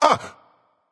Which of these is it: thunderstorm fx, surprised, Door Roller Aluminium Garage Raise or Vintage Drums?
surprised